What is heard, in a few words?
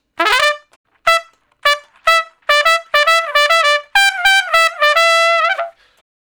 Trumpet Shuffle (E); Solos